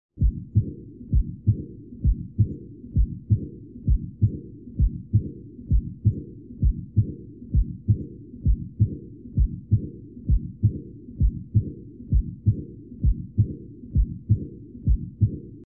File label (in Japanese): 心雑音 連続性 AR